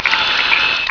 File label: hh saw cut; mk2